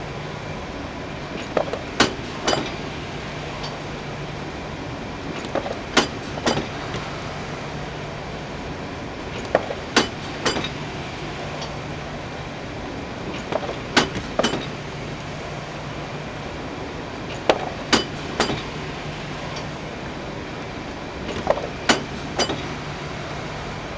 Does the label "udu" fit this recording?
No